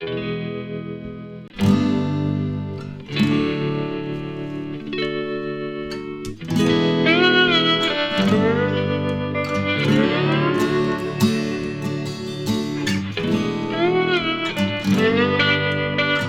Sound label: Lone drone